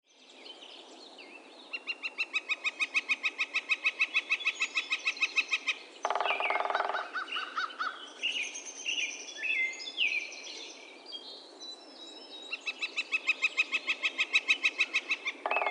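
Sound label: Northern Flicker Call Drum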